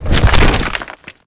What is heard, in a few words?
Spider Trap Activated; Lurker; Evolve; Gorgon